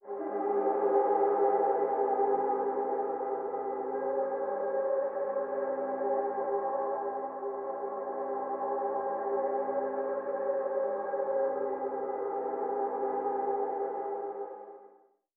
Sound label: false alarm; Rumbles